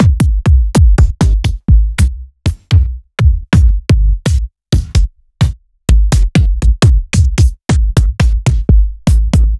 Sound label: Bassdrums; Sliced